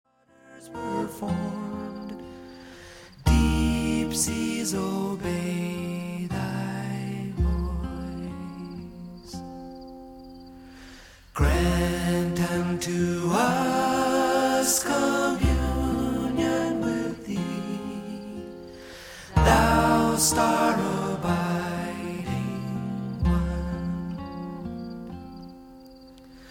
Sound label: Dakota Hymn